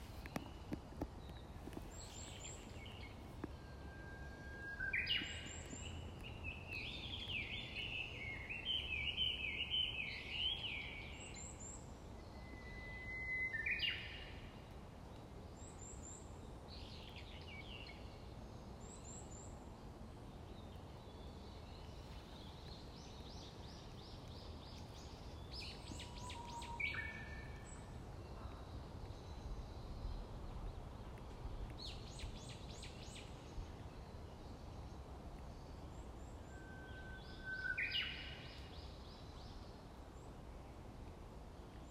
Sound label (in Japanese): Uguisu